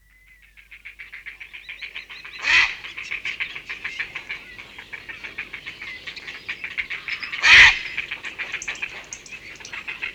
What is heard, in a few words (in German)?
Graureiher Ruf